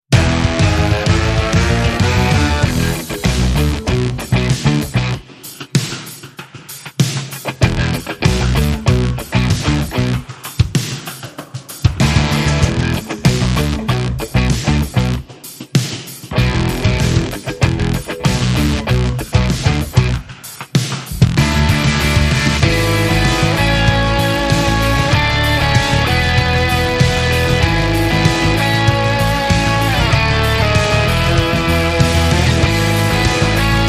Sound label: Rock, Lower Drum